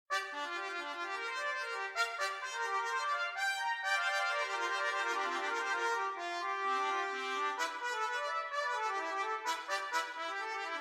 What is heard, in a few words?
Almost Chronic Pentatonic